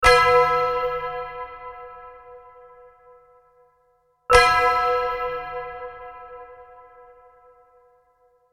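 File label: bell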